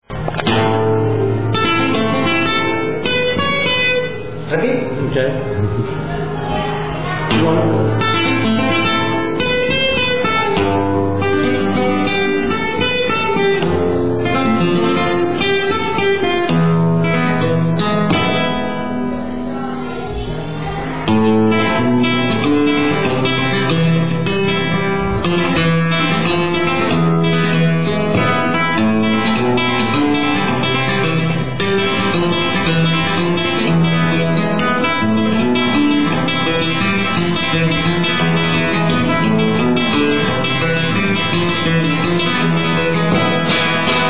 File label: Solea, guitar, Sevilla